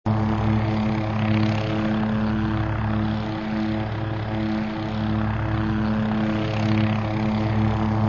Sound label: Plane Move Loop